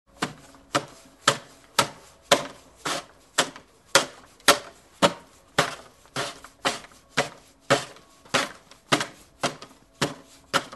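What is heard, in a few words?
shovel sounds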